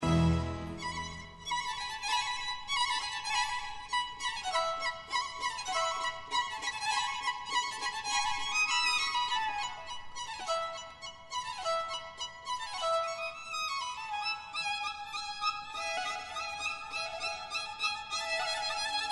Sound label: concerto violin string; vivaldi; op8 no1 mvt1